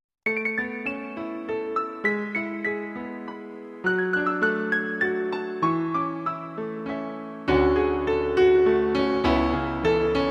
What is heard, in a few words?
Piano